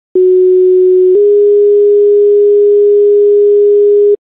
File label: siren, tones; elk